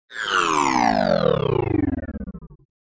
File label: Descend Electronic Ripple Descend with Static, Tremolo